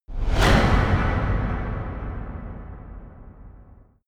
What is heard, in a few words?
long loud impact